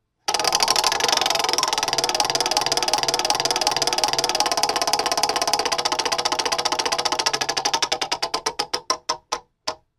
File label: spin wheel audio